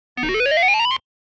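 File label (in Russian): звук домофона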